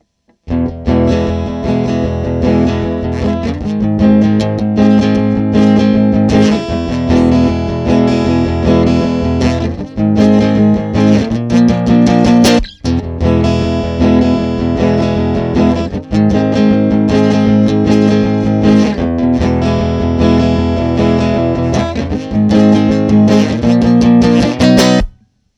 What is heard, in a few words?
Amp2 R1 D2 stereo